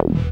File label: Synth Bass; Vengeance Samples; VEE Synth Shots